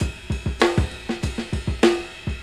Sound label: kettle boil, funky drum loop; Drum loops